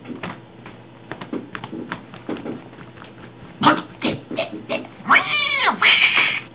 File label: cat